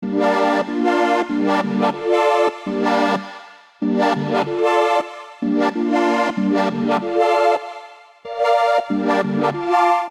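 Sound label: Lowrider Keys G minor 95 Bpm; MIDI Clips; Music; Ableton; Factory Packs; Vibrations; ModeAudio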